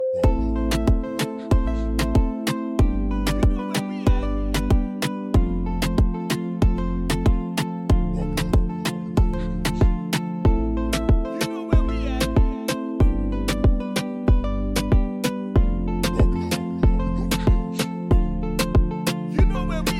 Latin Reggaeton

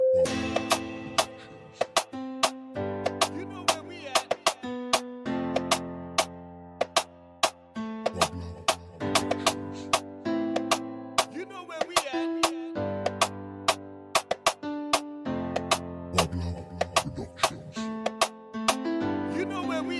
Latin Reggaeton

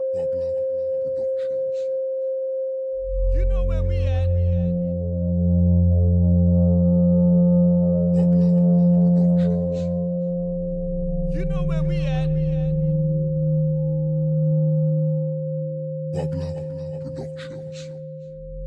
Reggae Dancehall

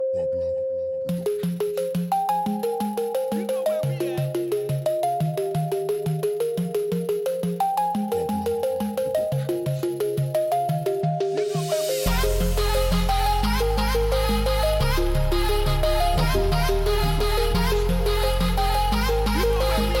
POP EDM

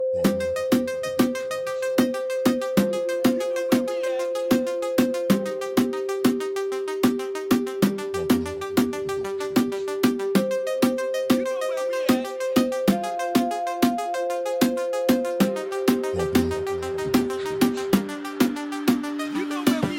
Latin Reggaeton

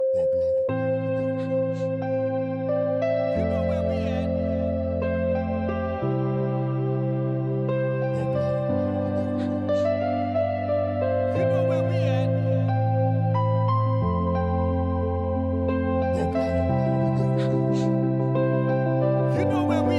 POP EDM

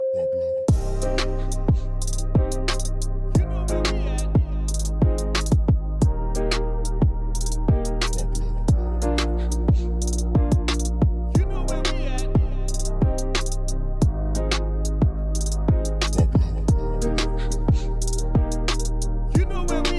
Reggae Dancehall

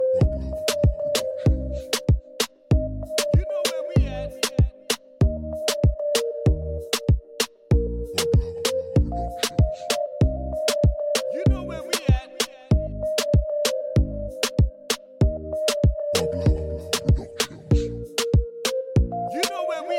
Latin Reggaeton

